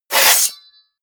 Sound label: blade draw large